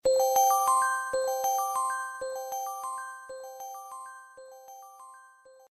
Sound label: Reminder Sound